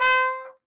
C Trumpet

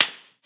Slap; talking tom cat 2 android ios online gamerip 2011

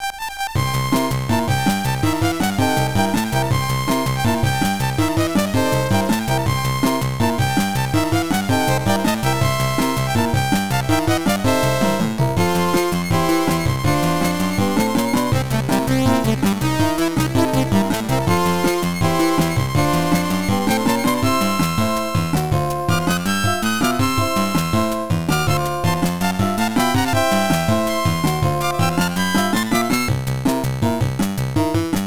Ingame Type B; Spin Pair; TurboGrafx-16

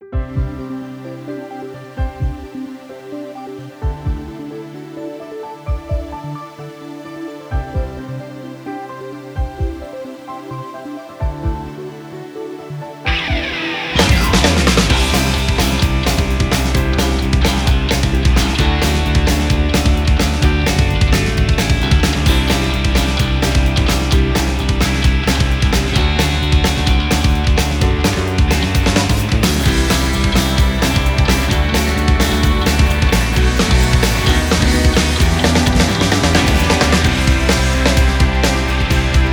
off vocal; Clochette; OP Song Collection

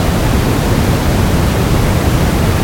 Waterfalls

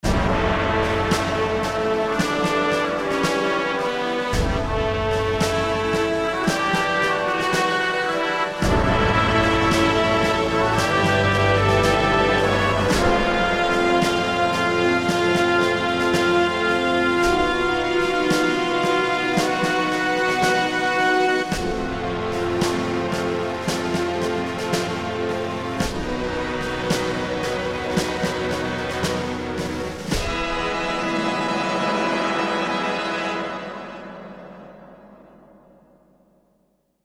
English Victory

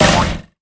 Beaten Sign Hit; Angry Birds

